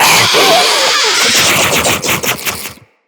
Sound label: Call; Infested; Diseased Ancient